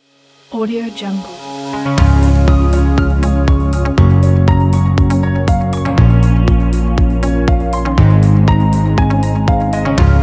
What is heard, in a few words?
kind piano; studio j